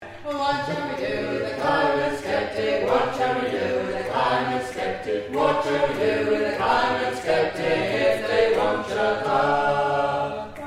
Bass

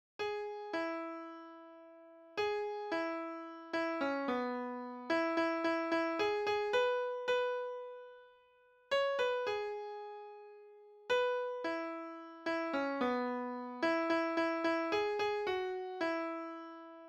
midi

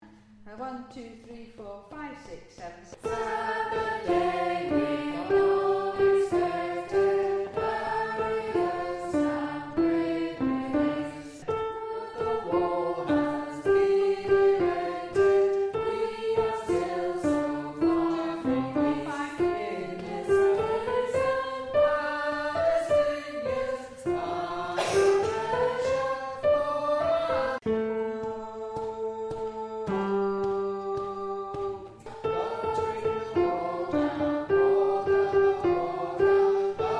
Tenor, singers

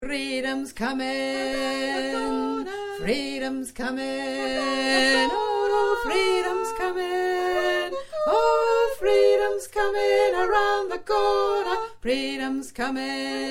mix bass

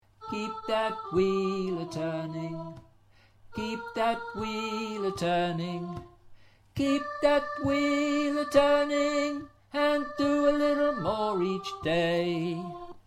Chorus Voice Tenor

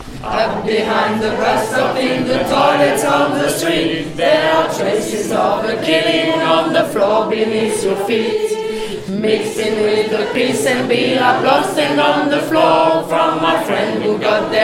bass

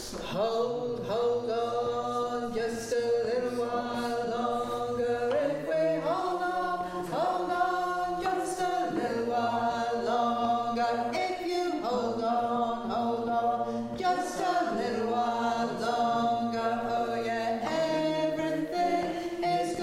Bass

